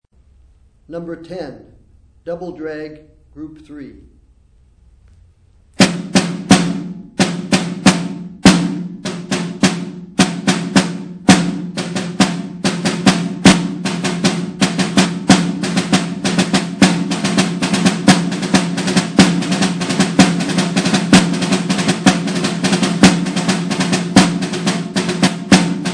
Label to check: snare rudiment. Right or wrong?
Right